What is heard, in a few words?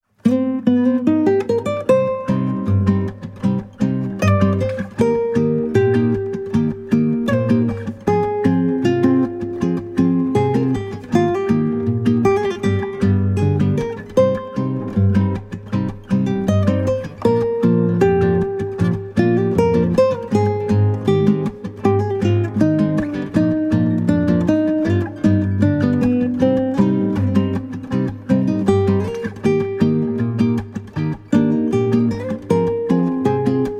Latino Song Guitar